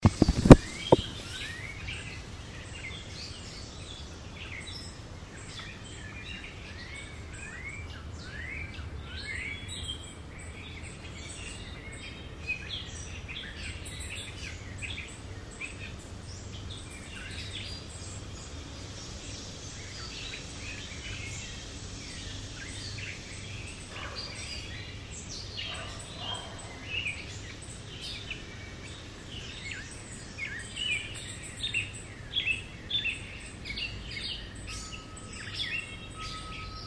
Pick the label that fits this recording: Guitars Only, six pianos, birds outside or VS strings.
birds outside